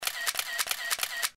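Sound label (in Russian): seriinaya sjemka fotoap, burst shooting of a camera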